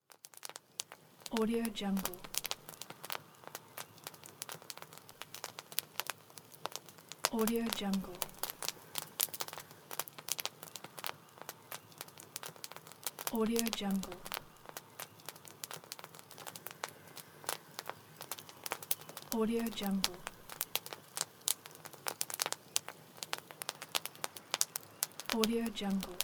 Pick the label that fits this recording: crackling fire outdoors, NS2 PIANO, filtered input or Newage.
crackling fire outdoors